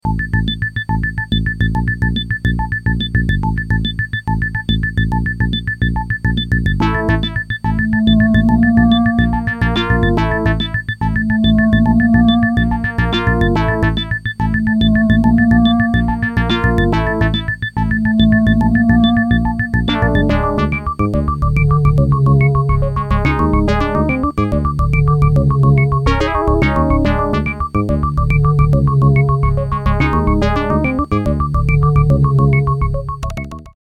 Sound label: Creative SB16